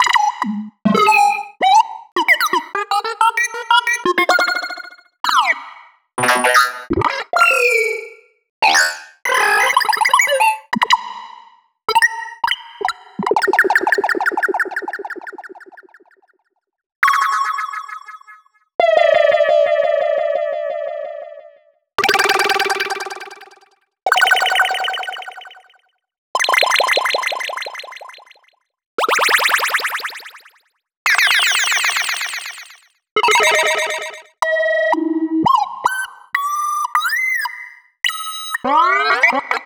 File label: Sci-Fi Lasers; Antidote